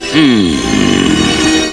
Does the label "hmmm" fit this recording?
Yes